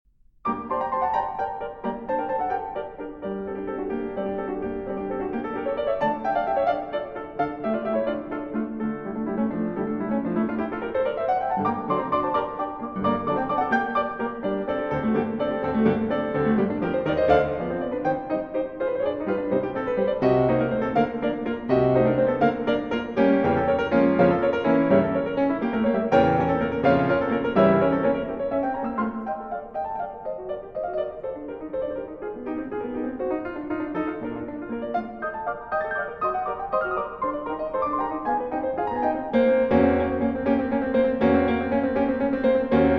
Scherzo, Transcr. For Piano; Mendelssohn; A Midsummer Night's Dream, Op. 61 I.; Rachmaninoff

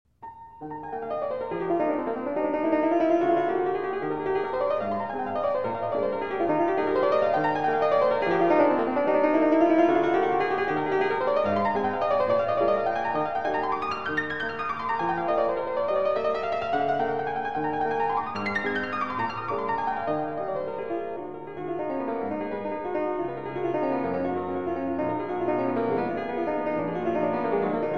Impromptus, Allegro in E-Flat Major

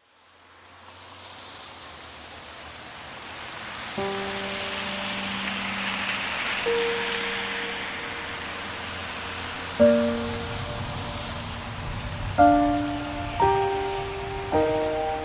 Piano and Rain

